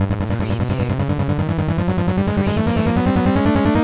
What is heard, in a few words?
Casino Slot Machine Winning Count Begin